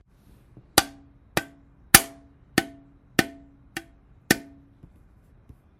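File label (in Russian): звук банки из под газировки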